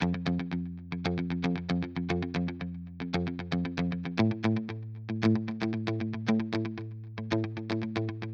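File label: E Gt Dry, electric guitar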